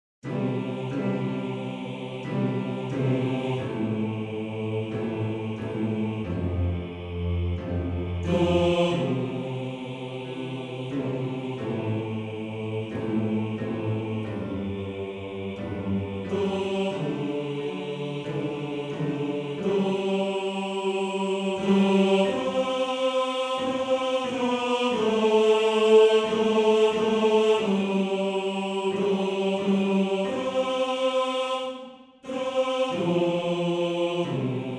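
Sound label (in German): Bass 2, Männerchor; Morgenrot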